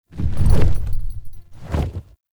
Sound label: Horse Glide Wing Flap Ambient; Warframe; Duviri Horse; Vehicles